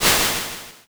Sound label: swoosh